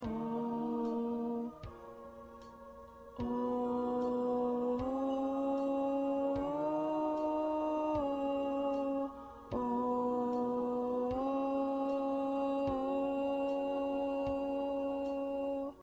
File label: Backup Tenor